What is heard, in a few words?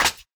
Snare; Ableton Live 9